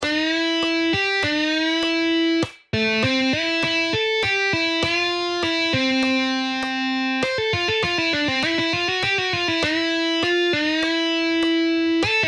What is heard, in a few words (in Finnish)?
Harjoitussoolo